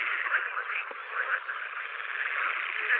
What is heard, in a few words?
Pool-Frog, frogs